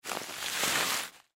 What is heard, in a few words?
Rock Salt Bag Slide, Foley Rock Salt Bag Slide Fast; Materials; Blastwave